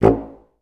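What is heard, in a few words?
staccato A0, Orchestra; Best Service; Peter Siedlaczek's Advanced Orchestra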